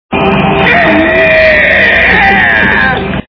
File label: scream; courage